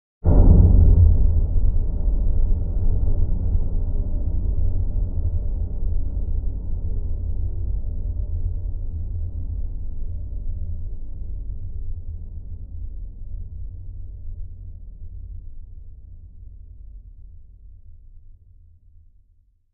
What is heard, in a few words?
Hit Large Underwater